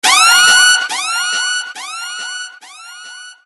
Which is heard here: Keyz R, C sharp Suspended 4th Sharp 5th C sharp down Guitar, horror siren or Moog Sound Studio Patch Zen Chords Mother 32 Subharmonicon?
horror siren